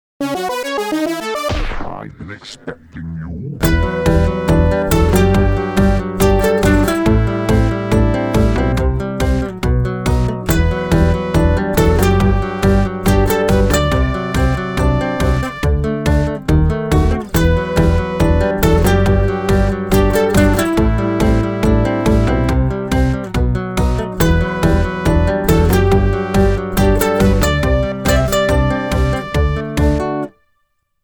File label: house guitar